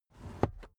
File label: car headrest full adjustment